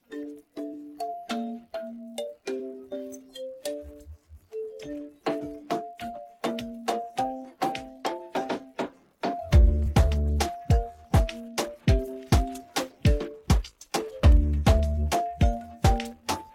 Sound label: instrumental